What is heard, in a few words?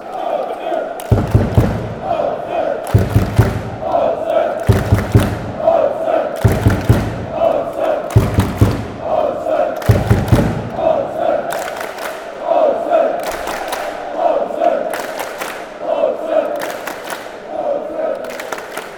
claps, fanchants; aalesunds